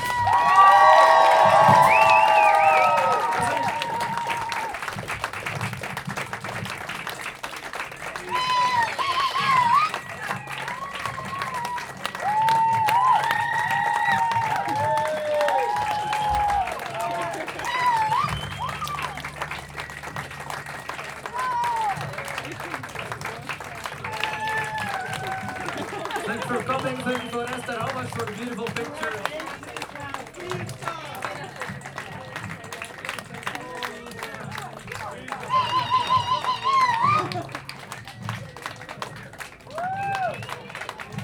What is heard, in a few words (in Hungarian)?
koncert közben ováció, romkocsma